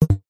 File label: multimedia alert error